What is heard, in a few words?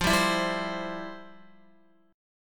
F Major 7th Suspended 2nd Guitar; strummed; 4F,4A,5C,5G,5C,6E; FM7sus2; JGuitar